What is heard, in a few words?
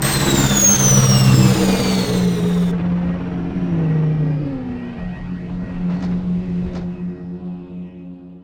landing; gmod; lvs star wars; laat